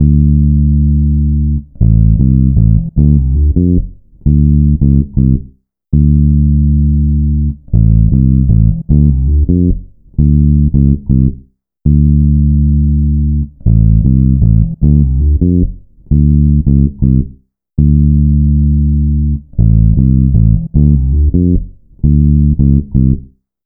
Bass, Electro Lounge; Sonic Foundry (Sony Creative Software); 081 Laser Love

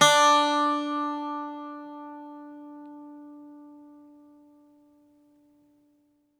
Greek Bazouki, Plucked Strings

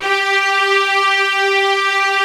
REAL ST G3 R, ARR STRNG

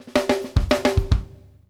OFF SNARE, DRUMWORKS FILLS 108

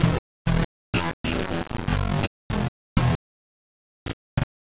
El Distorto, Basses; Sonic Foundry (Sony Creative Software); Modular Electronica

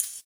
Ride; Equipped Music; Loungin House

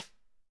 Sidestick; Digital Sound Factory

